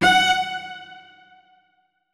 notes, keyboard; Hideout Furniture

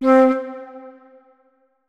flute notes; Hideout Furniture

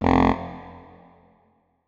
notes, saxophone; Hideout Furniture

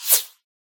whine, bullet cracks and impacts; Anomaly Bullet Cracks and Impacts Redone; weapons

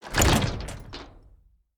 ambient soundscape underground

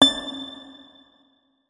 notes, guitar; Hideout Furniture

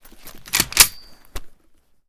sks unjam, weapons; Boomsticks and Sharpsticks

